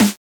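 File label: 909 Snare Dry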